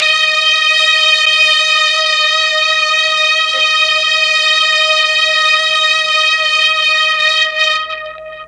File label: D#3 16 VIOLI, Mellotron; TRON 16VLNS; Mike Pinder - Mellotron Archive CD